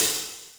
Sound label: CYMBAL, Yamaha RM 50